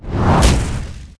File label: noon blast; sky emperor